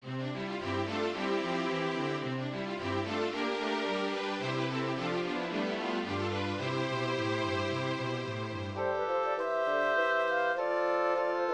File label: orchestral